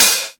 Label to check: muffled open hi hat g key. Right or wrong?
Right